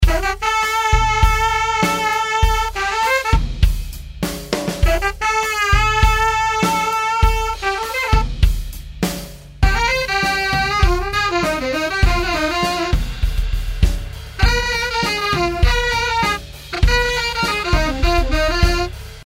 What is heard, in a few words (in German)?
Mit Reel ADT hebt das Saxofon ab; Waves Abbey Road Studios Reel ADT